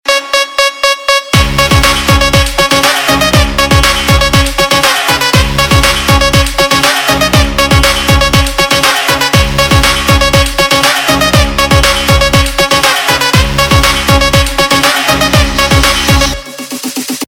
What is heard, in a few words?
Theme Ringtone, Punjabi Pop